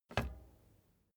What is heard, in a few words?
bicycle street kickstand hinge down soft; fahrrad; verkehr transport